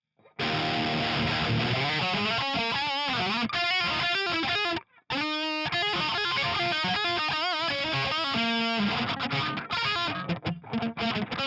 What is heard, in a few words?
Riff